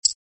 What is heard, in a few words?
chip land